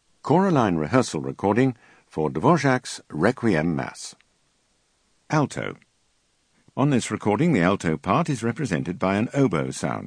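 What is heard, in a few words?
Alto; Dvorak Requiem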